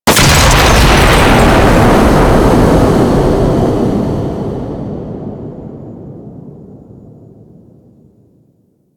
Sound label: Space Trench Run Large Power Cell Explosion; Explosions; Warframe